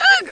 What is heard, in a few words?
honk